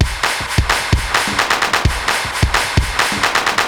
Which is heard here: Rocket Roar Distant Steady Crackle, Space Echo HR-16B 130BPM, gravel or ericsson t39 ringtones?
Space Echo HR-16B 130BPM